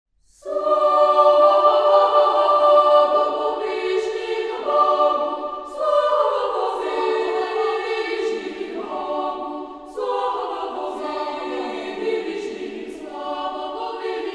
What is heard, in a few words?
Boys' Choir; Orthodox Music; Slava Vo Vishnih Bogu